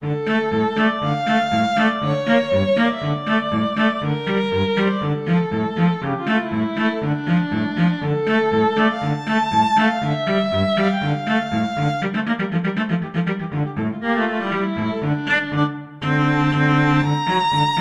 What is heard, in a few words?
brahms hungarian dance 5, violin-cello duet